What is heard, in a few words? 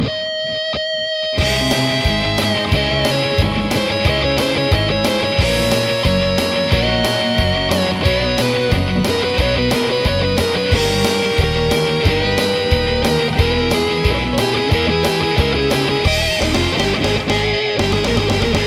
hungarian dance, ringtones classical